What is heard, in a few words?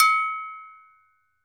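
TOKE BELL, African Perc; E-MU Producer Series Vol. 7 – Old World Instruments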